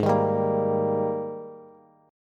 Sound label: Ab Major 7th Suspended 2nd Ab Bass; AbM7sus2; strummed; chordshape; 16,18,17,15; JGuitar